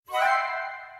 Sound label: match; jewelpetsmatch; jewels